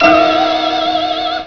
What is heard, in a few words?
INSECT; HIGHTECH